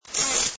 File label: buzz high